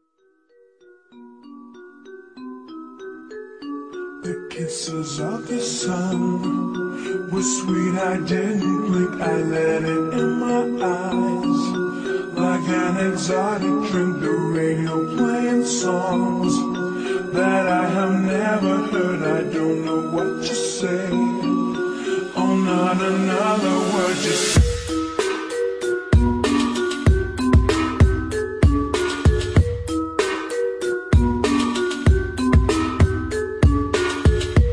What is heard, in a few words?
instrumental with hook